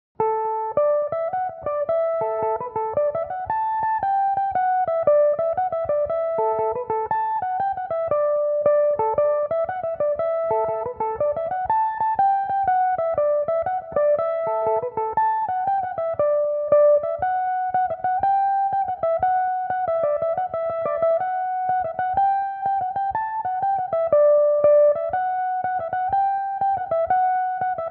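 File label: slide, D major, mandolin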